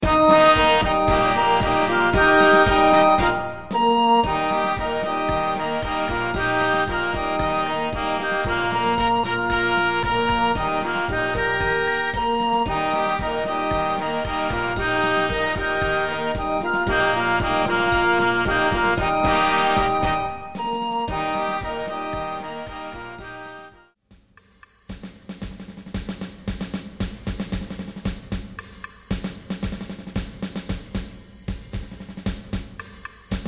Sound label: Salut in Swing